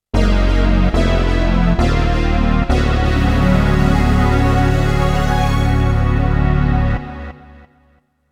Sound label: Game Over Theme; rakugaki showtime 1999 playstation